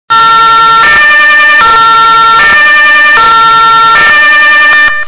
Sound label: horn; Jugend